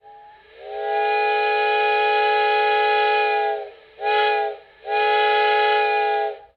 whistle, locomotive steam; Minecraft; Unleashed; railcraft

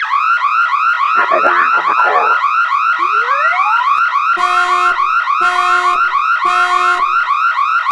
car alarm; vehicles

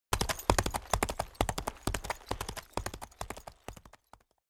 Horse; Age of Empires III

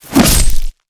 sword hit hi armor hi dmg; Mount&Blade Warband

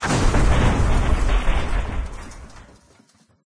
object explode; Tank

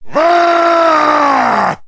man short yell; Mount&Blade Warband

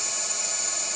sunbeam; KSP; Romfarer

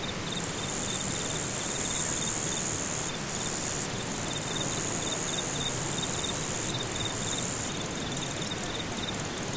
bug loop, ambience

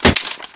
weapon impact hard, metal; physics; Garry's Mod; hl2

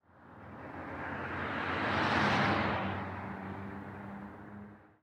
truck drive by; ambient; machines; Counter-Strike Global Offensive

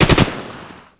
light infantry; Emperor - Battle for Dune

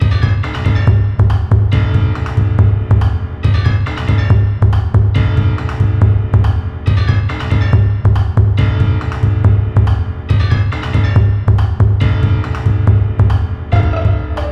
Action; Duke Nukem Forever

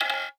weapon cant buy; Counter-Strike Global Offensive

